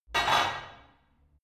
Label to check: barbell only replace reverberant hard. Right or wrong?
Right